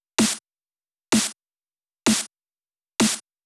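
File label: Drum Loop 128BPM snare Add; VEC5 Drumloops; VEC5 Multi Loops; Loop Elements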